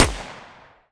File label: pistol fire; weapons; Half Life Alyx